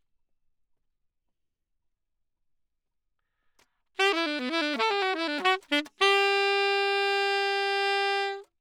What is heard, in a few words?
Sax; Gefell